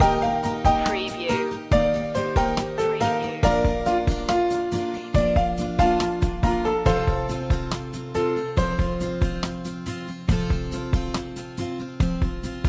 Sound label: acoustic loop ending